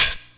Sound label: HAT; E-MU Formula 4000 Series Vol. 2